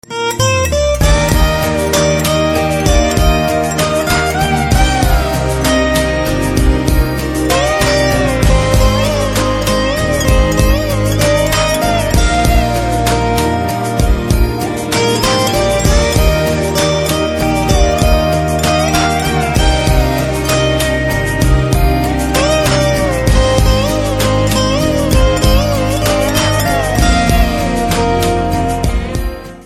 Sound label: Instrumental Ringtone